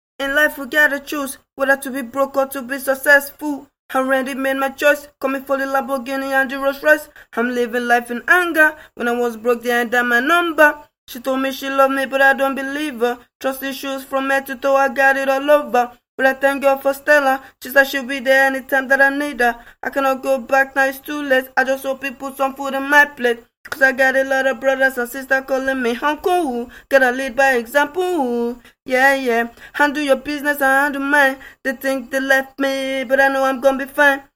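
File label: hip hop, 62, C sharp minor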